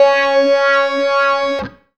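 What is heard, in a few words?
acid guitar (C#) wah fx; Sonic Foundry (Sony Creative Software); Ugly Remnants VOL-2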